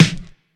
low snare sample E key, acoustic snare tuned to the E note, Loudest frequency: 1168Hz